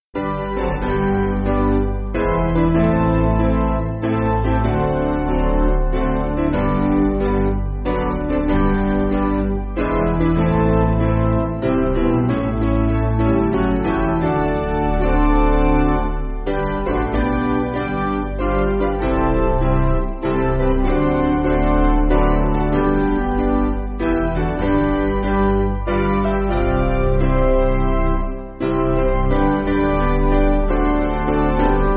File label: Hymnary Piano; Basic Piano & Organ (CM)